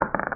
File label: ball end; Lucky25; effect